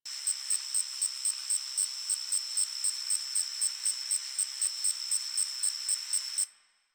Drifter Camp Night Tree Insect Loop, Insects, Ambience; One Offs; Warframe; Quests; The New War